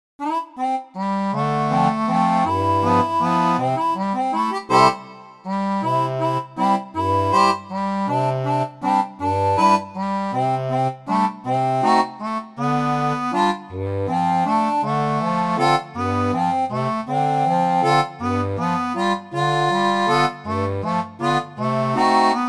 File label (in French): seraphone, orgue barbarie